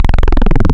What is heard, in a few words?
Saw Phase, wavetables; Animoog